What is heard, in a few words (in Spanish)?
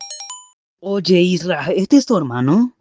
notificacion